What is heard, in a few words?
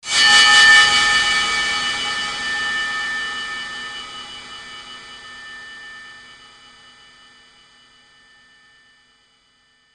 shock; animal